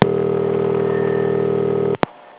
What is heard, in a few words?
tone only